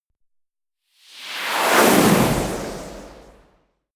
swoosh

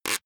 plastic pressured short

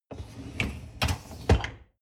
dishwasher upper tray draw in short

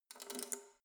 letterbox metal insert key soft

